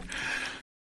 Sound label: inhaling loudly